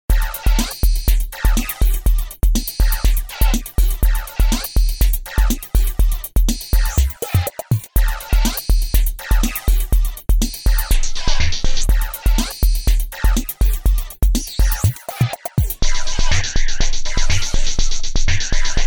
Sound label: drum; Emu XL1 Xtreme Lead